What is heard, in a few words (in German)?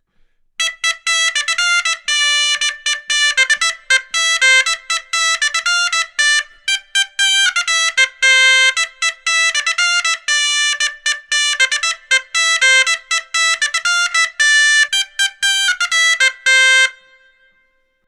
Rauschpfeife